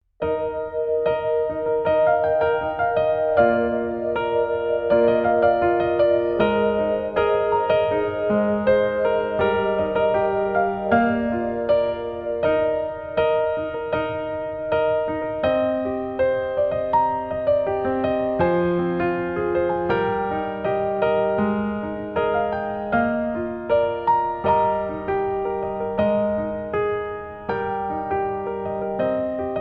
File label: instrumental sample